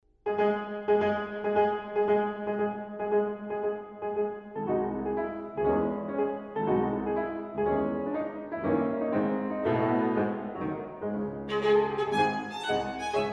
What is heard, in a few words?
Duo Sonata for Piano and Violin in C sharp minor; Franz Liszt (1811—1886); Duo (Sonate) für Klavier und Violine cis-Moll S 127. – (IV) Allegro con brio